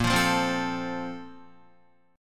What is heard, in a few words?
Bb Major 7th Suspended 2nd, Guitar; BbM7sus2 strummed; JGuitar